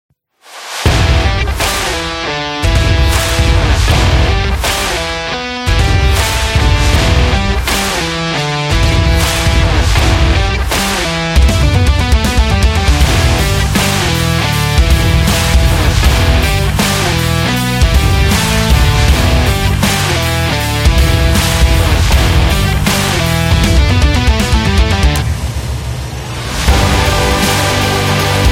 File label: The Indie